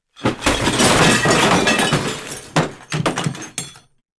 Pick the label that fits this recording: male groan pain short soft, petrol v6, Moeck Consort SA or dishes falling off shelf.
dishes falling off shelf